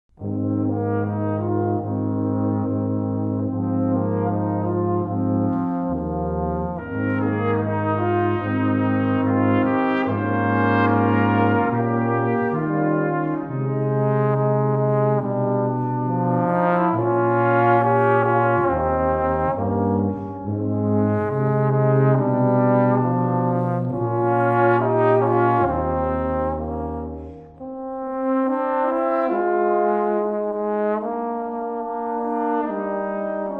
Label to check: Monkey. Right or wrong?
Wrong